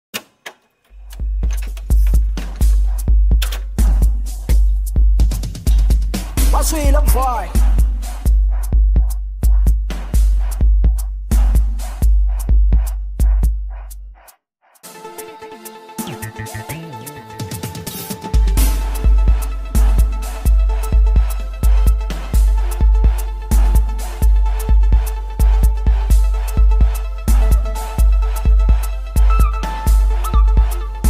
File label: Lekompo Sounds